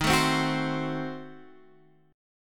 Eb Diminished 7th Guitar Open F, chord; Ebdim7 strummed; x,10,9,7,9,7; JGuitar

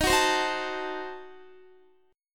D sharp Diminished 7th D sharp Guitar; strummed

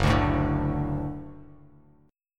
Gb Minor Sharp 5th, Bass Standard; strummed; JGuitar

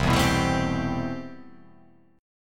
C Major 13th C

